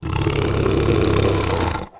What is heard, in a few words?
growl